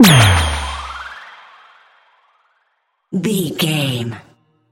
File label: magic blast